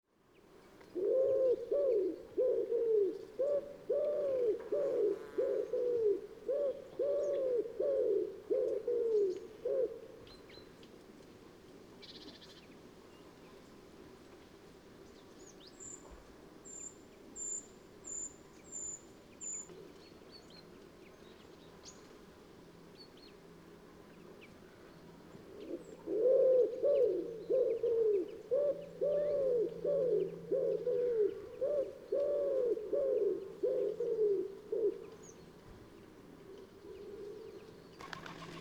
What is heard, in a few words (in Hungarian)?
örvös galamb hangja